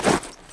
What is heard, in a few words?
fell; firefight